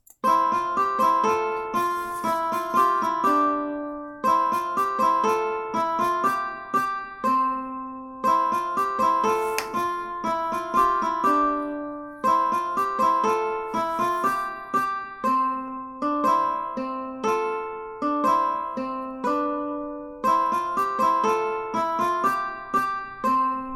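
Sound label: ukuleles 1 & 2; Shepherd's Hey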